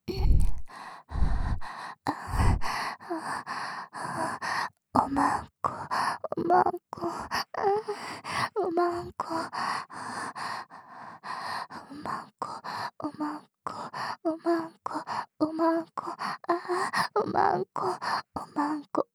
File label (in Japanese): 喘ぎ; お屋敷メイドのハーレム淫語ヘヴン 気が済むまで耳舐め淫語を堪能できるバイノーラルオナサポ音声